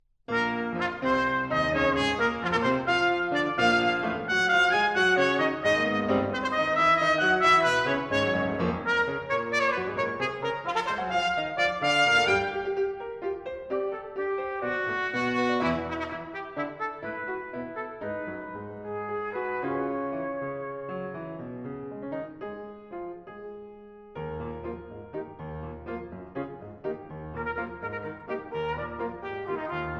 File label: Sonata For Trumpet & Piano, Moderately Fast, With Energy